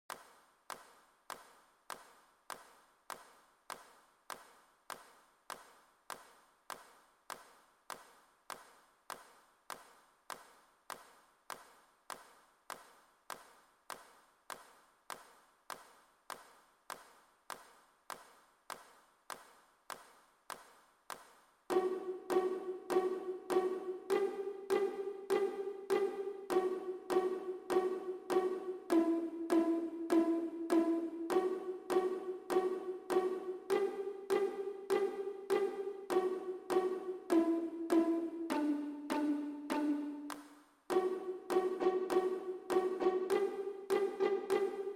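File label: pizz with clap violin viola; vln vla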